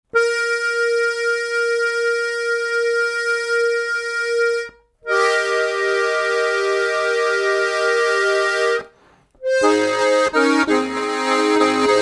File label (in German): ziach 12 Flach